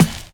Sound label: BD; Best Service; Dance Mega Drums